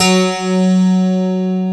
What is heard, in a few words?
PIA OLD, PIANOS